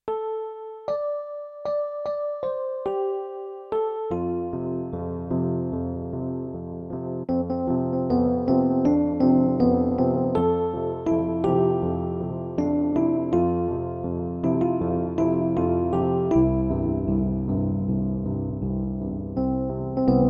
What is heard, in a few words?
piano